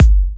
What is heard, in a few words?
Bassdrum; Vengeance Samples